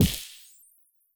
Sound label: hit ice; Learn to Code From Zero With Godot; ice fist; bullets